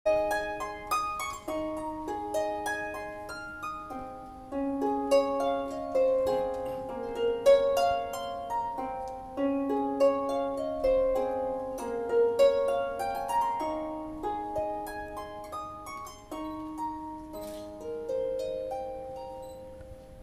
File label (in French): harpe; Landy ANDRIAMBOAVONJY